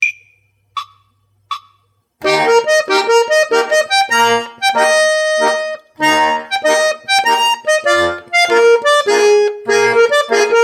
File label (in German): Mazurka